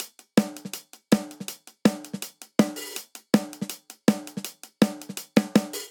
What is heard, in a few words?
Punk Rock Snare & Hihat 162 bpm; 4 4; MIDI Clips; Ableton; Factory Packs; Drum Booth